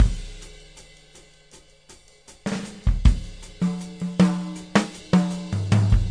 079 bpm natural ride shuffle, drum loops and breaks